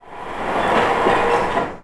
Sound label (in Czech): semafor; Mafie 1